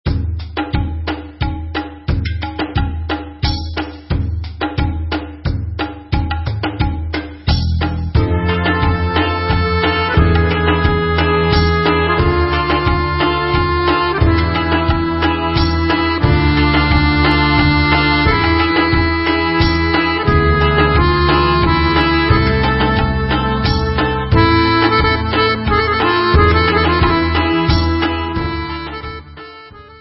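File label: Instrumental, Israeli Dances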